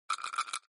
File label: dice roll